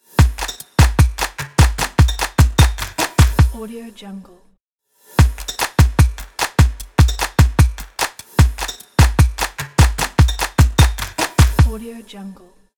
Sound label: percussion logo